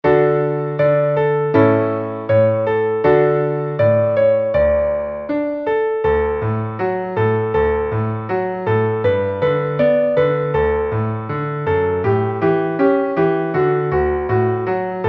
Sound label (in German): Es regnet es regnet, schnell, Klavier